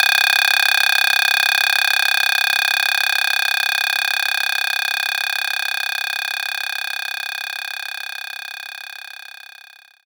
Bongo Rim; knights try

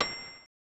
Ding!; Honkaku Shougi Fuuunji Ryuuou; SNES

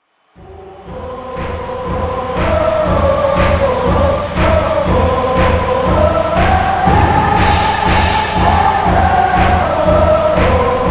Chants, Hotaru

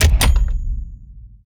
Main menu press play; Panorama

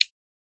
on back

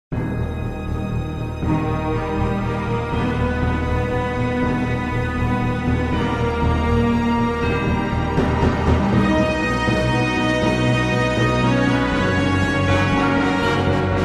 Intro Music; Star Trek: Elite Force II

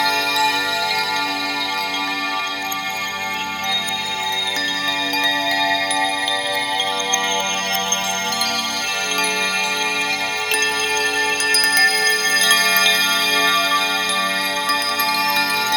Gameplay Ui; Prince of Persia The Lost Crown

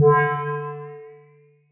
Target Sound

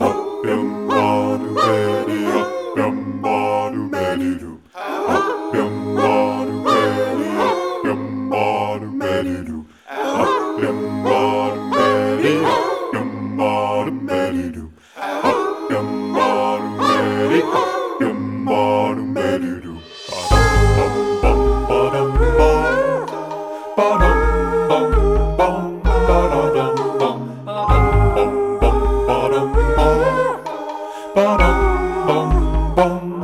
The Selkies Seal Song And Swimming Loop; burly men at sea ios macos ps vita ps4 switch windows gamerip 2016

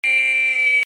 Jumpscare; FNAF Jumpscare

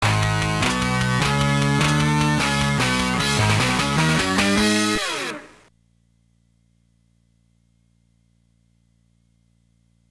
Triumph, Close Call or Victory ~ Battle End; Dynasty Warriors Advance; gba

